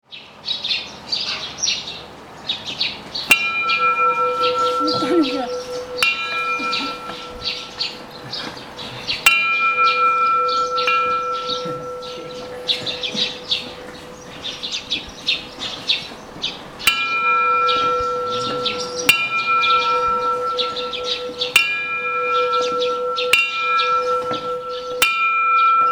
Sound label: Tibet Bells